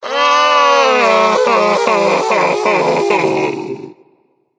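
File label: nonverbal boohoo; smite; voicepack; merlin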